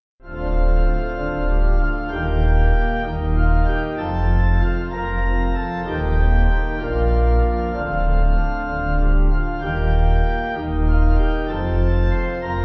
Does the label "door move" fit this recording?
No